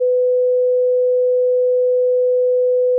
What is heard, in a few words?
All Clear